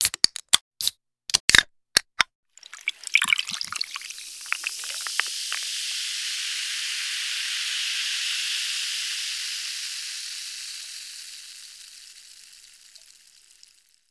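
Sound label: Pepsi soda can being opened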